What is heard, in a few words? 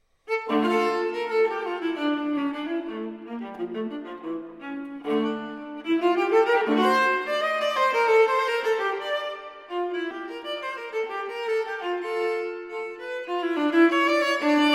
Cello Suite No. 2 in D Minor, for Viola, Allemande; J.S. Bach Cello Suite No. 2 in D Minor, BWV 1008 (Transcr. for Viola) II. Allemande